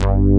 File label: ANA BASS, JV 1080; Pro-Rec - Synthsonic